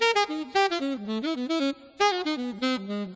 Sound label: alto; Lemur